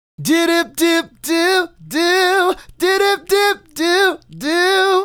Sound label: Male Solo, Hip-Hop and RnB Vocals